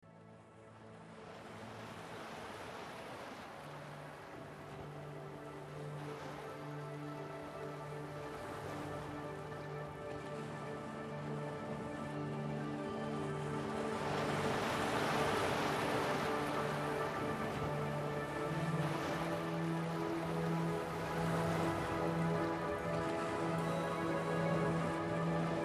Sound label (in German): Entspannungsmusik